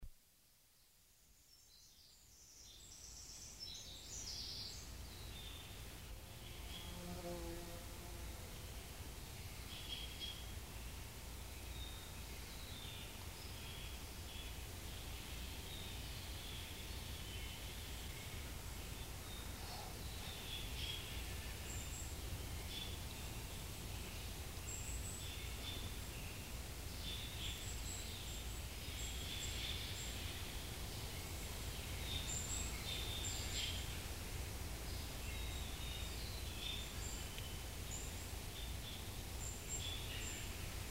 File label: rain in the forest with birds